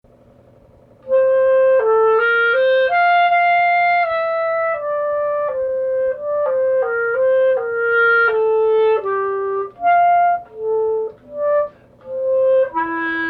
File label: Clarinet Andante